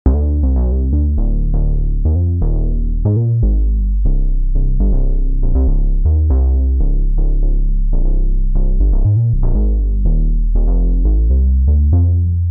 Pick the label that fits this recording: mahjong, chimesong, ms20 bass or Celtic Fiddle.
ms20 bass